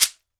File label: SHEKERE, Drums&Percussion